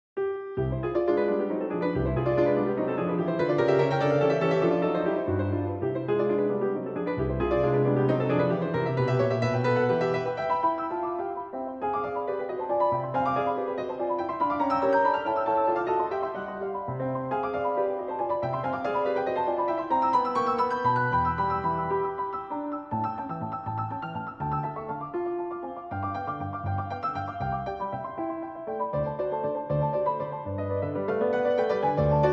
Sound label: Etude in C major; Etude Op. 10 No. 7; Toccata